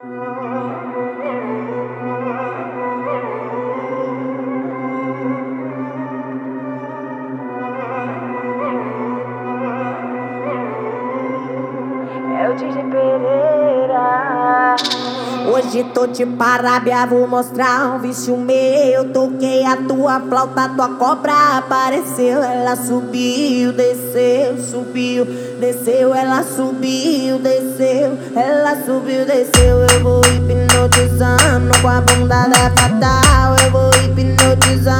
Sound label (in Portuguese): Flauta